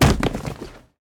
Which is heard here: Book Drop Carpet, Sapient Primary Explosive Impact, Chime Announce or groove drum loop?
Book Drop Carpet